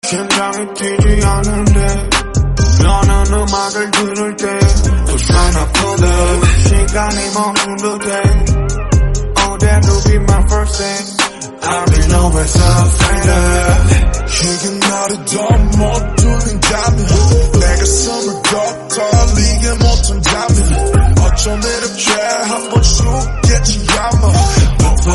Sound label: Korean Song